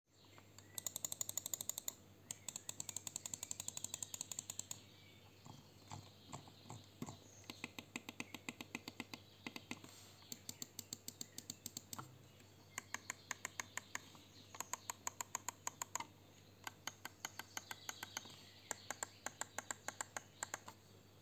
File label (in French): thermaltake argent m5 wireless rgb, souris; tests peripherique tests souris overclocking